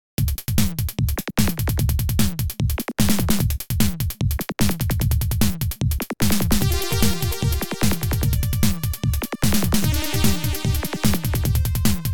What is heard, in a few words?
OPL2; Tyrian